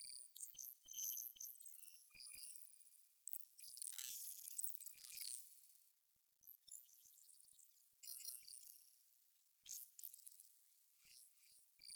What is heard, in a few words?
UI Mod Screen Loop; Warframe; Railjack; Player Ship